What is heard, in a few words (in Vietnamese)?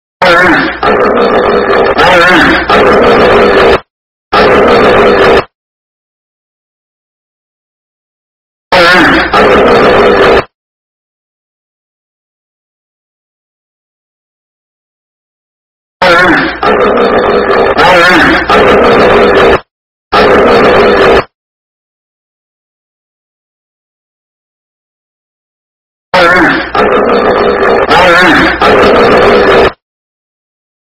Tiếng con hà mã kêu